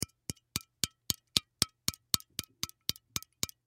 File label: Soda Can Tap, Soda Can Full Tap Top; Tapping Sound Effects; Objects; Foley